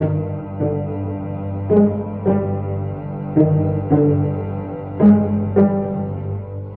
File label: strings, Sad Loops